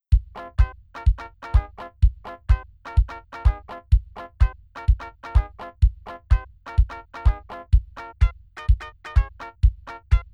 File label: fitness music 432 Hz; 126 BPM